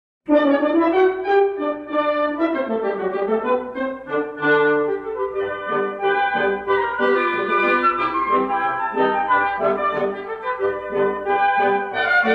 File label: Weeping Willow Rag